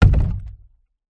industrial cannister small plastic liquid down concrete